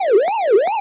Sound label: ghost